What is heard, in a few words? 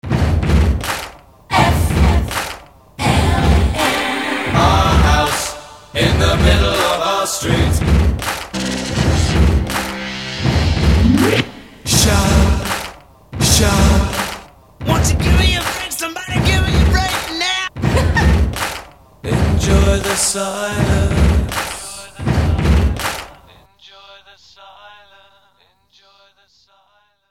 retro jingle